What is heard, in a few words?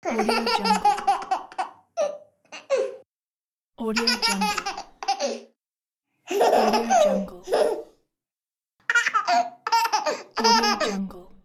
laughing baby